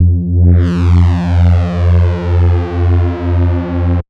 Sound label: bass tweak insert F; Polyend; ARC Noise